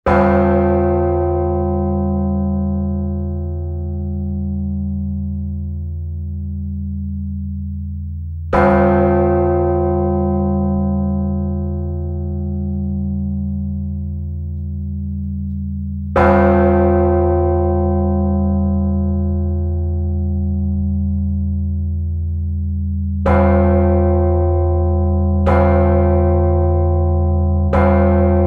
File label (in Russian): Колокольные звоны, Благовест; Покровский собор г; Колокольные звоны Татарстанской митрополии; kolokolnye zvona tatarstana